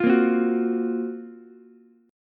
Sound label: Gb Major 7th Flat 5th Ukulele; GbM7b5 strummed; JGuitar